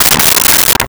Soda Can Fall; Crashes; 1001 Sound Effects

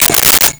Telephone Rotary Dial; Communications; 1001 Sound Effects

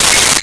Metal Clang

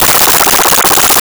Arcade Movement

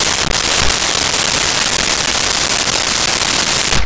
Machine Gun Bursts; Weapons & Explosions

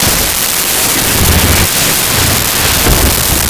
skratch explosion; Sound Effects Library (28Cds) plus bonuses

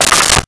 Wood 2x4; 1001 Sound Effects

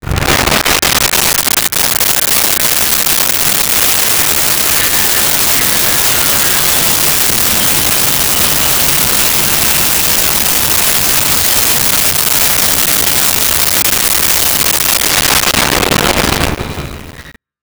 A10 Attack Jet; Aircraft

